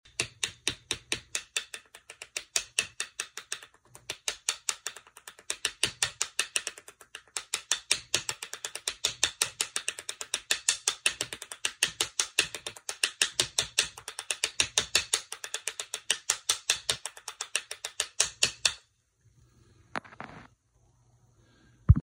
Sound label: tap